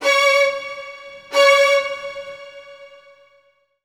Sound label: STRGS, Roots Funk; USB Soundscan vol.41; AKAI